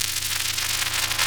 Buzzing Power Glitch

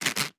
Elastic Rubber Double Creak

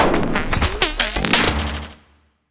Sci-fi Malfunction Rumble

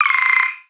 Multiple UI Beeps

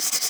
Hard Slide Multiple

